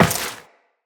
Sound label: Step Metal, Footsteps Walk; Tenno